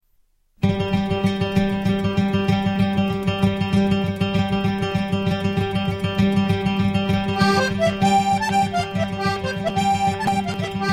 Tiedeliepats, Pays-Bas

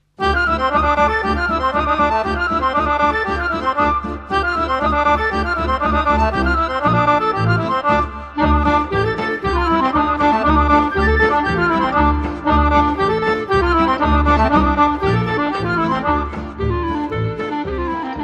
Kulsko Choro, Bulgarie

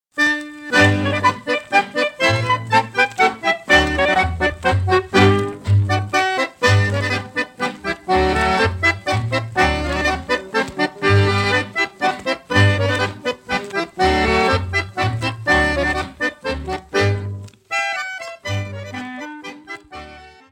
Tyroler Plattler, Autriche

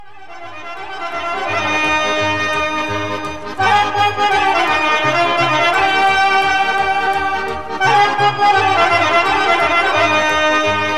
Horo, Bulgarie

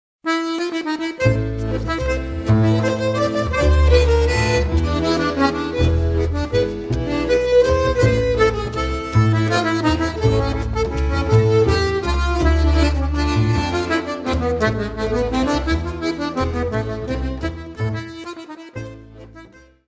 Valse Venezuelienne - Venezuela

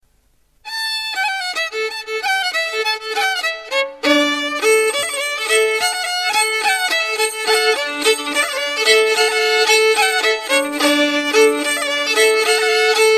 Bourree - Scottish - Valse - France

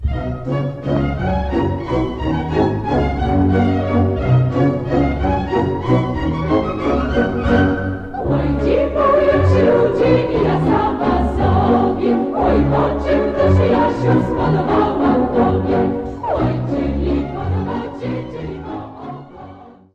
Mazur, Pologne

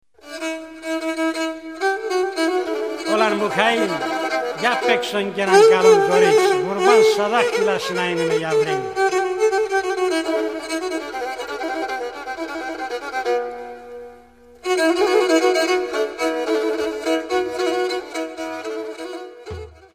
Grece

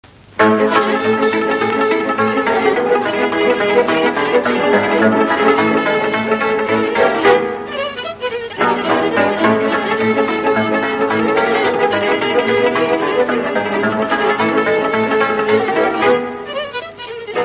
Sirba Olteneasca - Roumanie

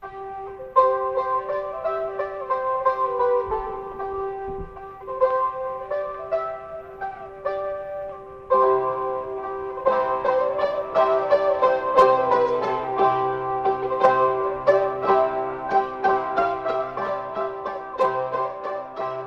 Vieille Maclote, Belgique; Vieille Maclote d'Emeroulle

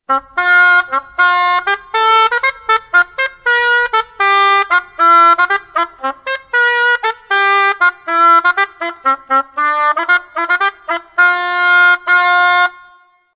hautbois ancien; instruments

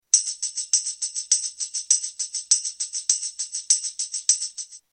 tambour sur cadre

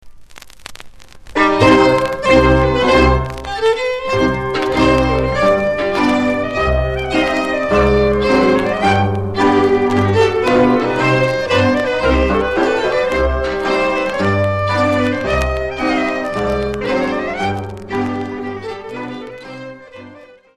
Verbunk - Hongrie; Verbunk de Kapuvar

